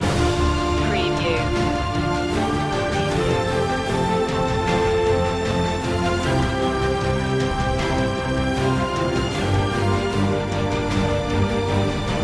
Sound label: no choir